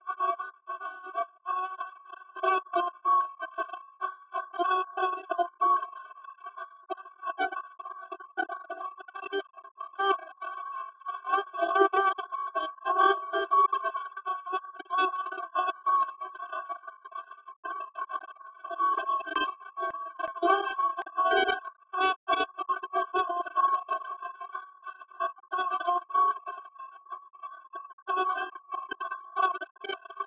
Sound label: Jazz